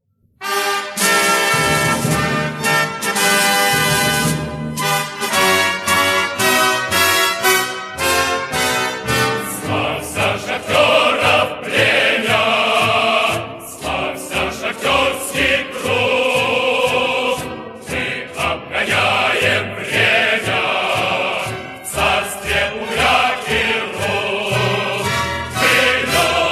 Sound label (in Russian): Marsh shahterov